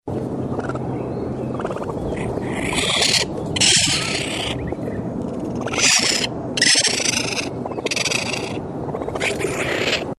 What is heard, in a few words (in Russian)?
golodnyj enot